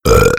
heavy meleeing; tf; duke

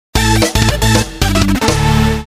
win; tf2ware

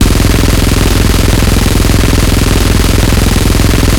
minigun fire; hl2dm

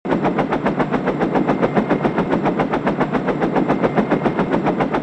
gyrocopter use

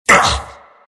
pain; tf; skeleton king

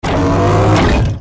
megamann pickup

